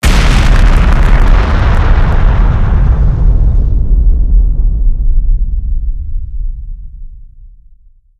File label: missile explosion; video games; doomsday; source engine; tf